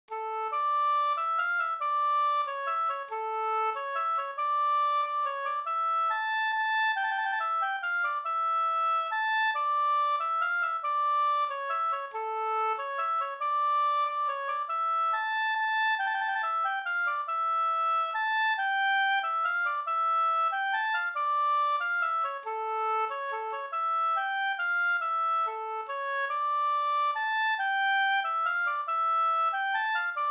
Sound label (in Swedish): vals